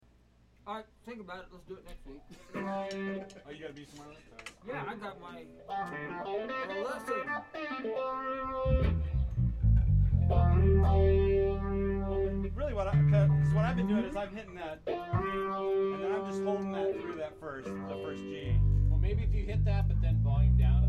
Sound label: Room Mic